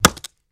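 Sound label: Staff Wood Hit Slight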